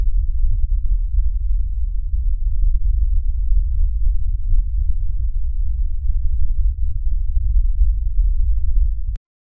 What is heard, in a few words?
dingy background noise in, bunker